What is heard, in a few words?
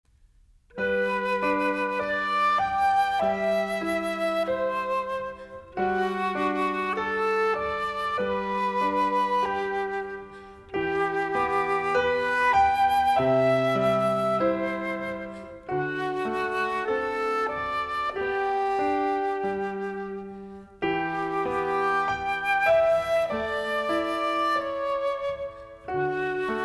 prelude music only